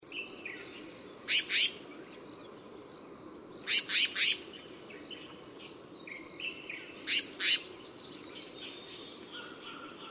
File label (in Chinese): 黑枕藍鶲; 黑枕王鶲; 王鶲科/留鳥